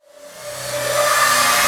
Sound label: reverse, FX; Vengeance Samples